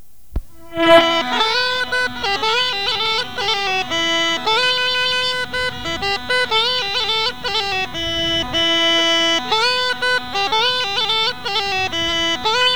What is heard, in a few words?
Group, Rodope Gajada; Lesson Recordings; Vasil - Rodope Gajada Lessons